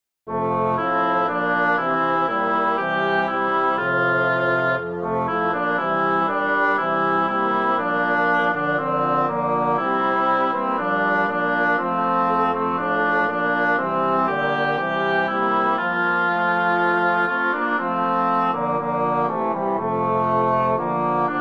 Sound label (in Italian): Tutti; Un clocher dans la brume